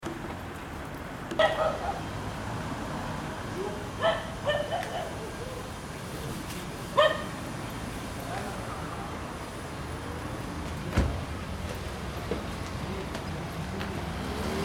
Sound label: soundscapes collide